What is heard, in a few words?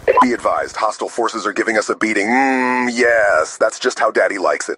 clapping cheeks